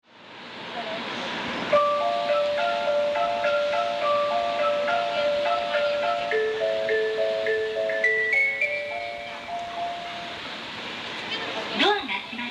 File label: melody, station